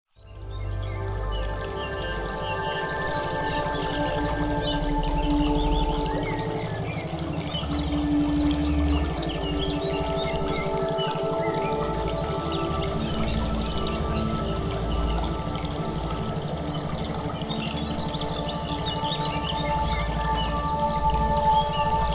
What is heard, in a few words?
Mood Elevator Gamma Isochronic Tones Brainwave Entrainment